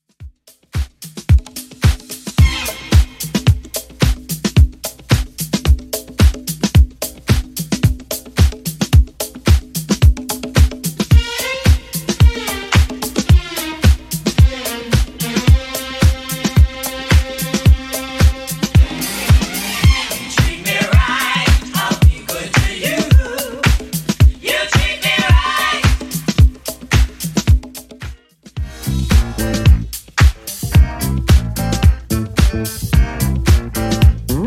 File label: 70s Redrum 110bpm